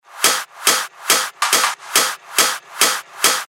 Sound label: 140 BPM, techno loops; Magister; revealed techno top loops vol 8